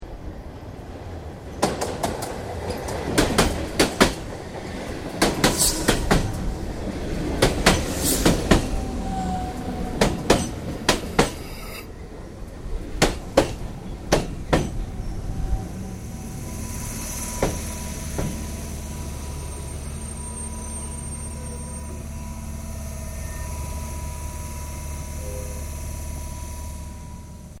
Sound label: Train Melbourne Exterior Arrives Station Underground